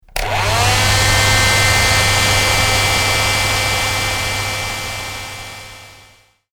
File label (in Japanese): ドライヤー